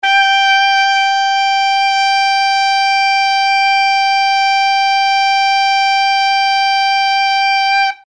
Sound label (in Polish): E3, saksofon; aplikatura; in Eb